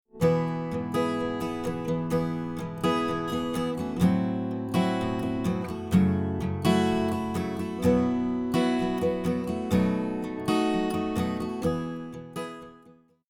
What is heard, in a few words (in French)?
guitare acoustic sans